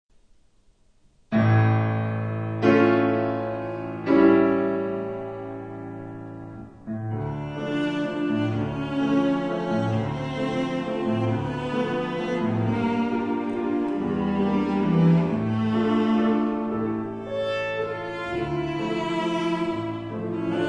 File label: Romance for Cello Piano Variation